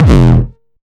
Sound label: 909 Dist Reso - E1, Kicks; Drums; Mbase 11 Kicks